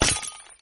casse

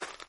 herbe, pas

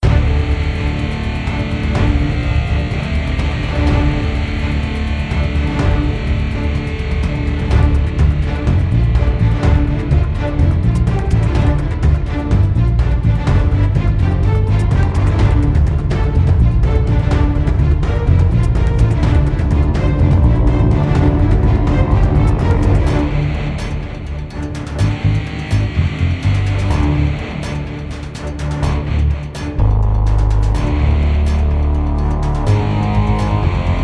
musique; fortoresse

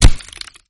casse

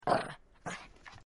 touche, monstres; deadmaze

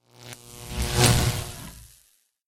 electrique

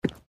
cheval

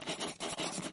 ecriture

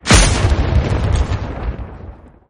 foudre

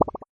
bulle

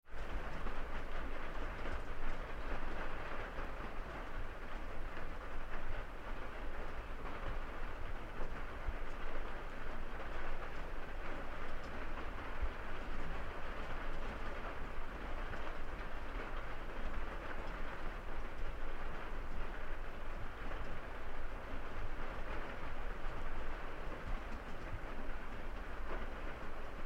pluie interieur, ambiance

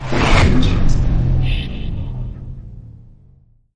mort sorcier

